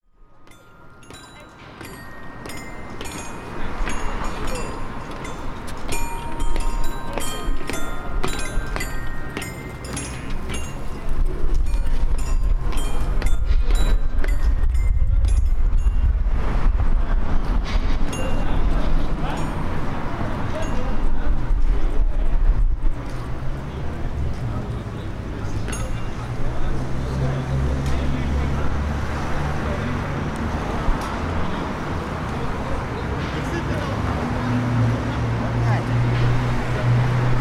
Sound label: pigeon square